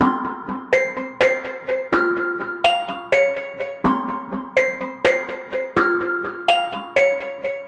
125 minor plucky synth melody; SYNTH AND ELECTRONIC LOOPS

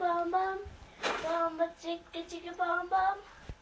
bom bom chika bom bom, Children; VOCALS and SPOKEN WORD

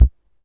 vox bd, Vox AC1 RhythmVOX; drum machines

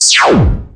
smatter zap; Bleeps Blips Blonks Blarts and Zaps

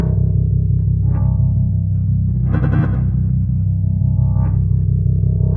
moody space bass loop; MELODIC SAMPLES

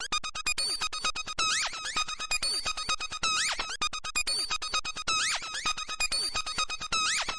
130 mosquito trance synth; SYNTH AND ELECTRONIC LOOPS

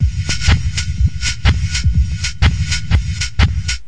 deep cymbal, drum loops and breaks; 124 bpm

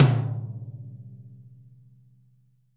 thin tom, Multisampled Real Kit

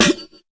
fx drum, Electro FX

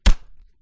stomach punch; Kung Fu; Kung Fu Construction Kit

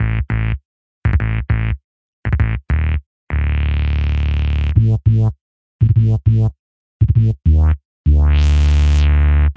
100 funky two octave bass, bass loops

